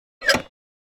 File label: Scooter side stand up